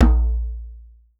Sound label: African set percussion; Voice Crystal - Steve Reid Definitive Percussion